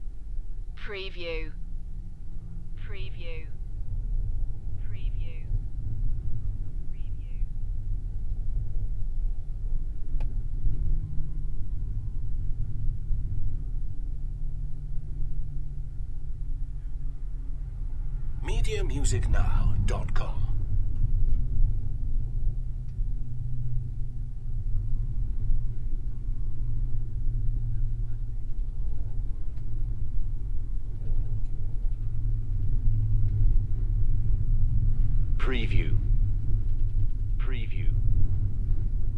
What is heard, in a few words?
Wind aircraft noise; beanstalk audio